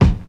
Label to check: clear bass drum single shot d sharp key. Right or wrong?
Right